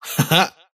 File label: wheel laugh; dota; kez